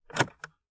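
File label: Nissan Sentra interior point of view as key is inserted into ignition, and lighter is pushed in and out; Vehicles, Compact Ignition, Car Lighter, Cigarette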